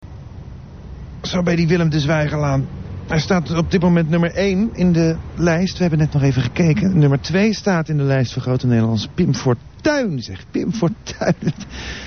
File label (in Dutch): Lachen